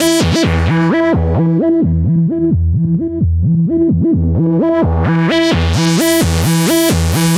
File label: NRG Sweep, Trance